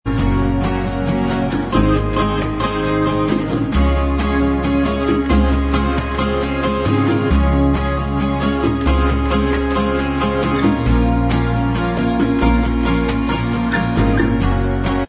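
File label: acoustic instrumental Track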